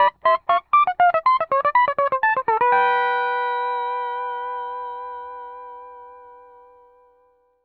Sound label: PD LOOPS 120, Whole Lotta Country